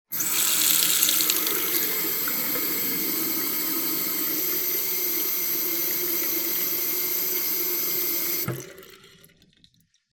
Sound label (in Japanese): 蛇口の音